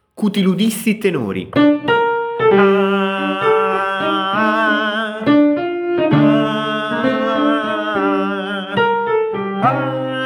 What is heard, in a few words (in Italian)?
tenori